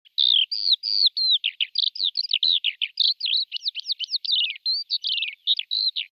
Eurasian skylark chirping and singing

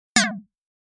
HUD futuristic button deny

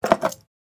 keys pick up from wooden drawer

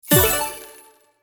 Money notification chime purchase sale

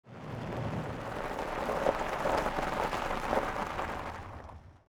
Car arriving on gravel wheel noise

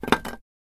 Jenga wooden blocks moving

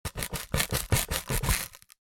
Cardboard box cut slice with knife

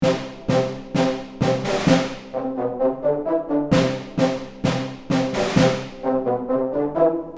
Field drum and brass marching loop

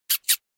Mouse squeaking and communicating

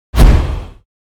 Vampire vanish dark dissolve

